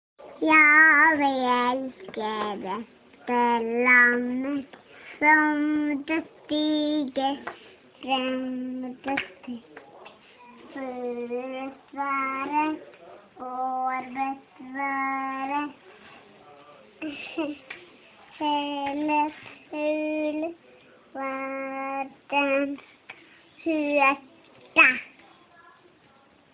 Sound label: sjunger ja vi elsker, toåringen; nasjonaldagen